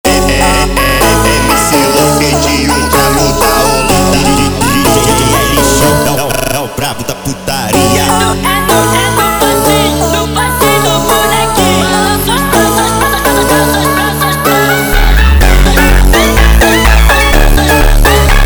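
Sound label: phonk music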